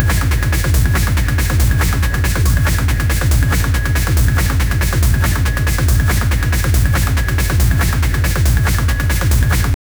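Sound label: a hardcore techno rave beat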